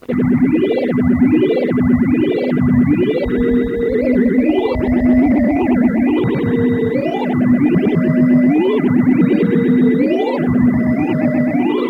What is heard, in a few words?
Keyboard effects